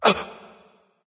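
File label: nonverbal surprised; smite; voicepack; ullr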